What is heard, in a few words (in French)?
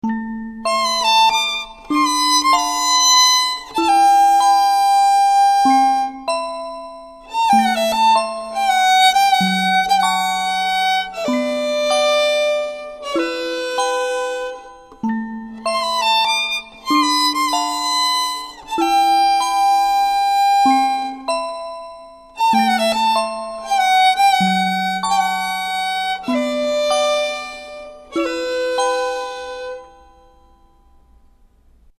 Violon